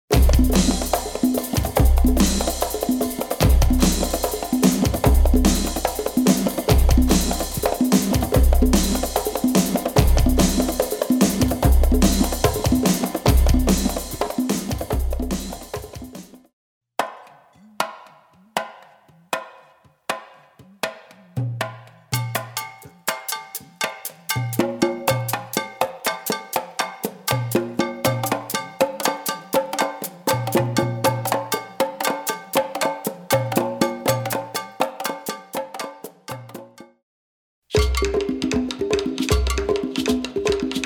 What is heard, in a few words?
Rhumba